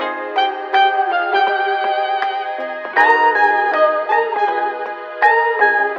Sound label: keys; Phonky